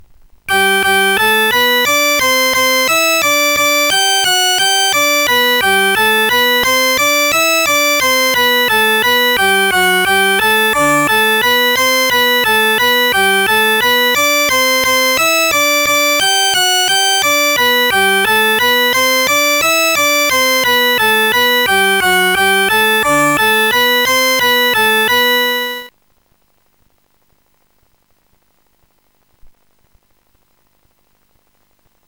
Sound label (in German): orgel; Sonix Music Driver